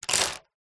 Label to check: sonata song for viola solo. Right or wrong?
Wrong